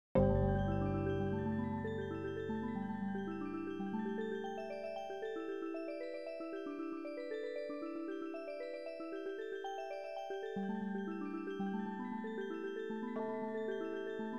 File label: kalimba showcase